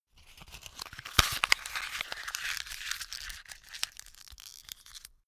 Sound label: notepad sheet crumple